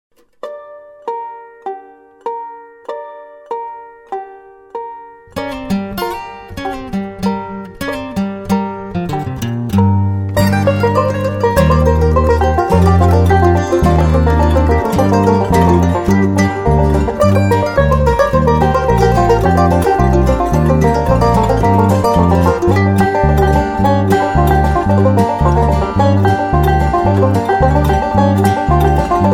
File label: appalachian rain, bluegrass; kitchengrass; Appalačský dážď